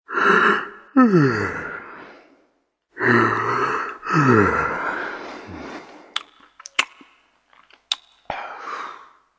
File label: Yawn